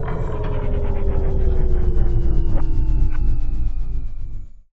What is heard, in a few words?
gate power down; space-exploration 0.5.58